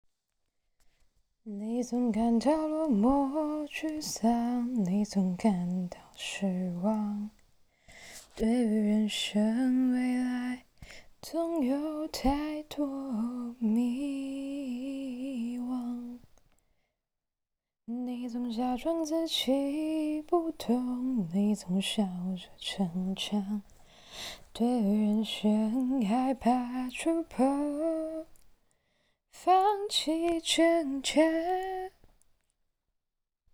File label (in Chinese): 中文歌曲